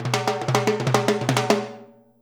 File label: FILL, Percussion